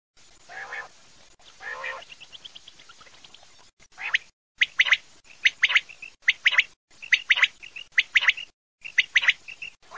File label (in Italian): QUAGLIA